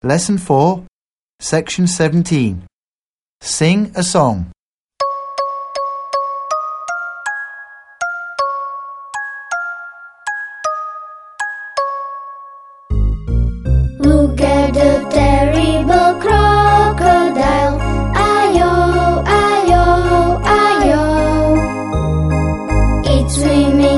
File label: song crocodile; busy bee 4